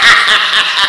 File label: hahahha; People; Sound Library 2000; Wayzata Technology